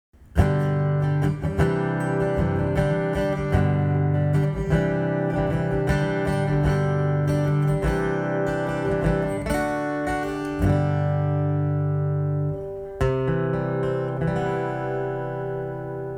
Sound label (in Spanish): Folk Acustico